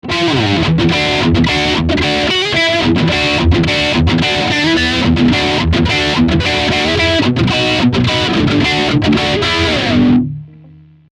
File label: Boss GT-10 R-Fier